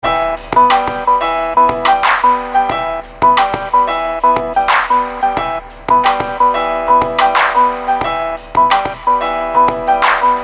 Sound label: RING LAIDBACK; HTC; Ring Tone; E-Club Ring Tone Package 1